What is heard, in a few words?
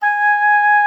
G#5, OB D.AMORE; Xsample VOL-7